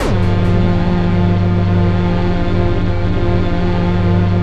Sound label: JV-1080